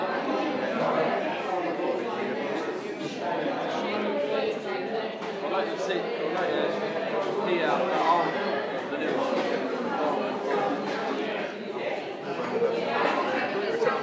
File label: the noise at 5am in tyneside cinema